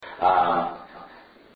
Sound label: Uh Huh or Laugh; DiningRm